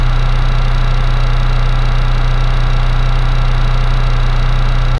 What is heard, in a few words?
electric vehicle